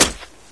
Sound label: near foot heavy, antlion guard; npc; source engine; episodic